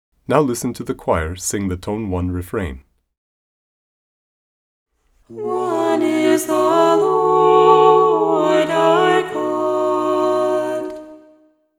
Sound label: Refrain Key Hymn (Choir); Tone 1